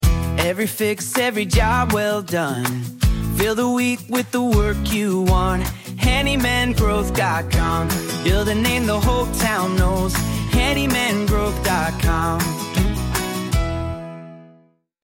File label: jingle